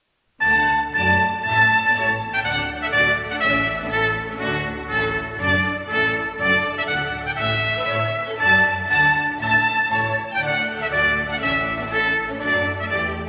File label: Trumpet Tune en re menor; MUSICA RELIGIOSA; H. Purcell